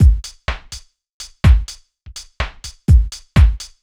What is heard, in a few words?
DEEPHOUSE BEATS 125